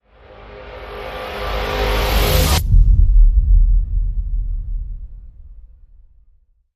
Rev Warpath

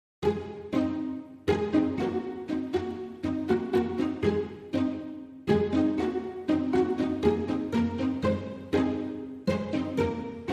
Hedge Hogs, No Woodwind

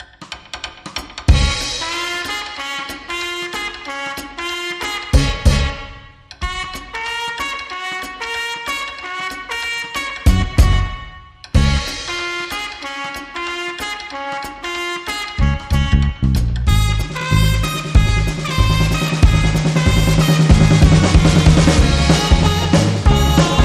Clean Instrumental

